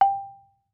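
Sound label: Marimba rubber fortissimo G4; MIS Pitches - 2014; Percussion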